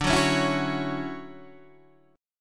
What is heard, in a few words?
D-Major 9th D down Guitar DADGAD